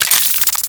Modular Secondary Handle Reload, Guns; Warframe; SU Modular Guns; Reloads

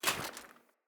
Foley Action Armour Walk; Deora Armour

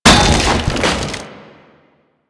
Wood Bench Destroy; Warframe; Ambience; NineteenNinetyNine

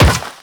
Run Metal, Footsteps

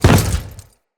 Step Default, Footsteps; Grineer

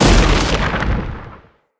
Lightning Slam Explosion; Characters; Enemy; Abilities; Sinecure; Banneret

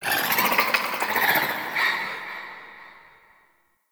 Sentient Lair Exterior Creature; Warframe

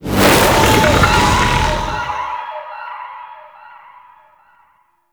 Flame Coil Ability Cast; Warframe; The New War; Archon; Amar; Abilities

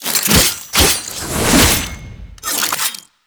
Finisher Damage; Kuva Lich; Warframe

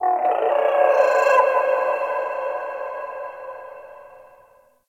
Venus Landscape Distant Creatures Zone Two; Warframe; Venus Open World; Ambience

